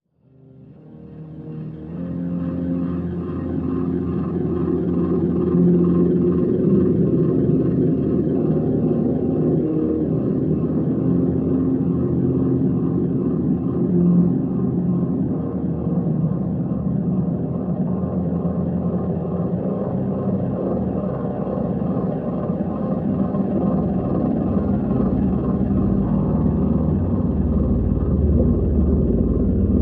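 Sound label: Drone Locust Nest Simple Mid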